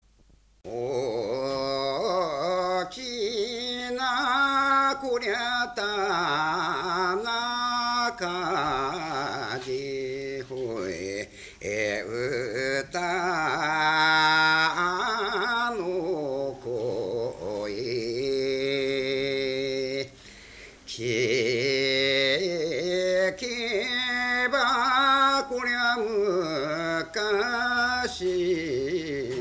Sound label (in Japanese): taueuta, minyou